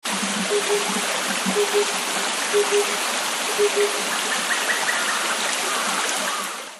tsutsu

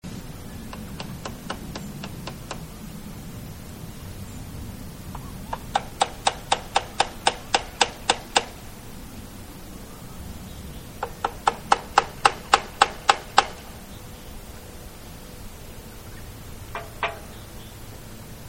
kumagera

